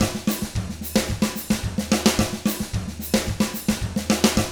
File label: 110 SAMBA, Drums; ILIO; Double Platinum Drums 1